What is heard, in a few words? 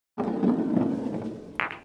roll clunk cue ball; PC Gamer IT CD 45